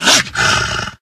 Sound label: pdog attack, pseudodog; russian; sven; russian sounds